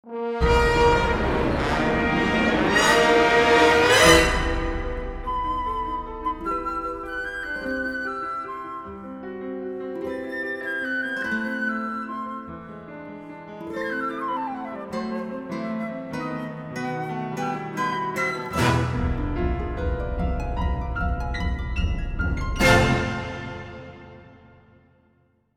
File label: Flute Solo Adventure